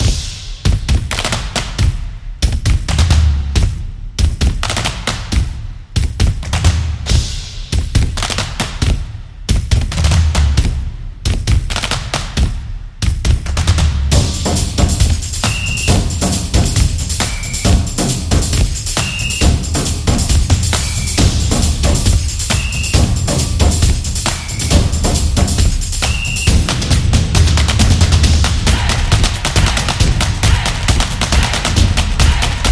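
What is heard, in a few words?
The Sport Dynamic, Street percussion background music built for sport, motion, and dance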